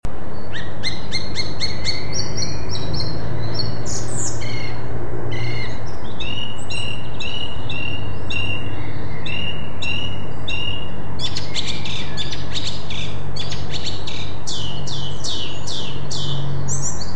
singdrossel, rufe vögel; voegel

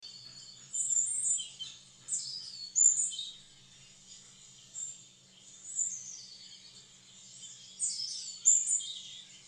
rotkehlchen

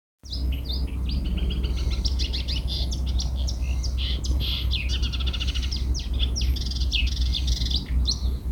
sumpfrohrsaenger

